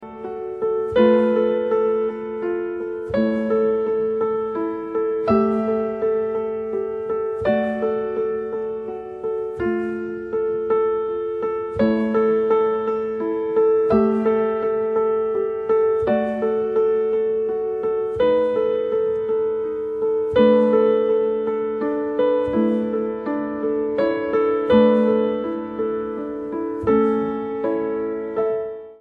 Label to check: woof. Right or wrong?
Wrong